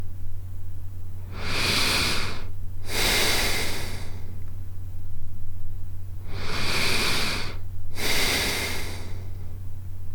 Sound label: Deep Slow Male Breath